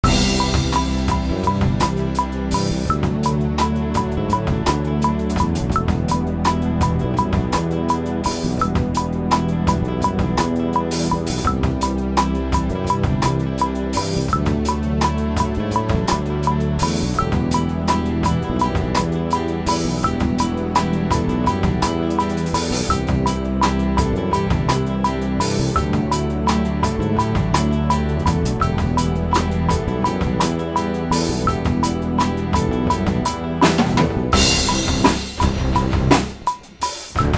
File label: drum recording, tascam dr 40; tascam dr 40 review